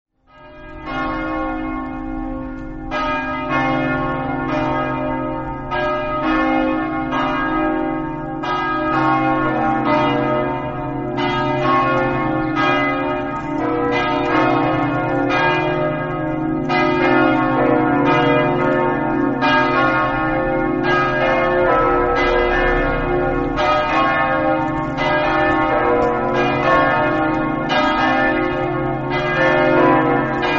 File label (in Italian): tolmezzo, campane